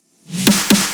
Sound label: fill